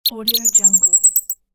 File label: hamster